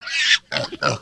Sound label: Pets Warthog Happy; creatures; Lego